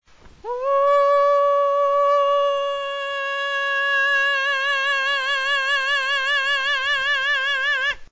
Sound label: falsetto faringea